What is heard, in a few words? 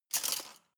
cutlery large multiple drawer grab